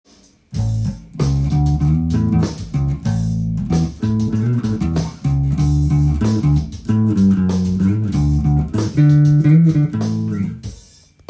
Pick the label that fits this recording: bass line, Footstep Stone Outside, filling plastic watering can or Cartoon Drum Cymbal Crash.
bass line